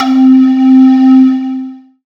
Ambient Atmos, Dance Synths

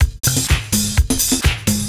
PERCS, 70 s Breakbeats

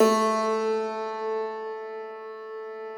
Thumb Tack Piano mp +ped, A1; Total Piano

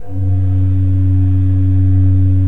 F1, Cathedral Organ; ILIO; Propeller Islands

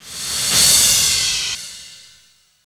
CRASH -R, Drum & Bass; Ueberschall; Drum & Bass Resonance

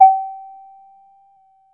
D#1, A BASS; CMP - Analogon

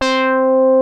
FAT BASS, BASS STATION; Syntec; Wall of Sounds

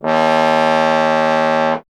trombone; Miroslav Vitous; Solo Instruments VOL-1

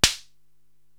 SD Donkamatic, Korg TR-Rack drum machine

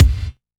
Kick solid; Sonic Foundry (Sony Creative Software); Ugly Remnants VOL-2; Beatstuff

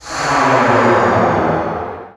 NOISE, Production Effects

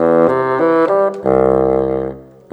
Bassoon, Orchestral, Rock Pop

